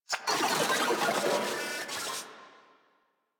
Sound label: Moa Vocal Death; Enemies; Warframe